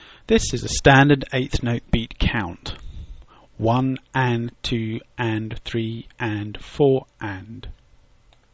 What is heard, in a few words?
8th note beat count